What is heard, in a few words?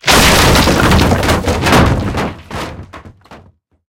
wood mid